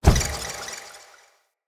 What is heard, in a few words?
Appear, Animals Foley; Floppet